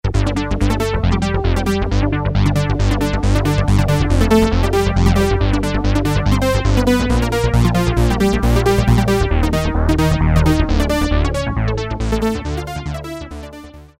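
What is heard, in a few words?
Arpeggiator, Roland Alpha Juno-2; Factory A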